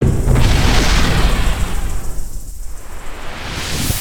Last Blast; Sorcerer

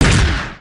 cannon blast; destructibles

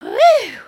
stink; emotes

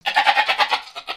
Pets Goat Happy; creatures; Lego

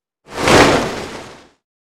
Flap Sweetener; creatures organic

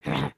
Walk; Lego Strombie; build mode

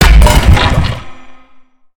Spider Die Hit Goo; creatures mechanical; Lego

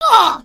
death

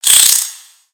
Spider Attack Melee; creatures mechanical; Lego

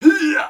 grunt; creatures organic

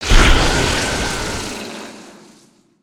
Spider Boss Melee Charge Yell; creatures mechanical

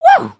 Clap

